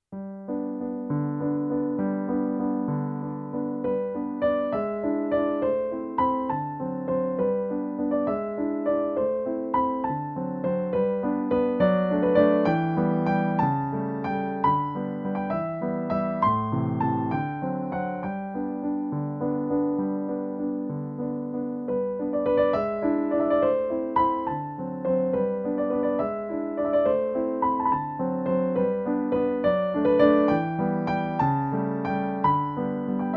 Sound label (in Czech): klavir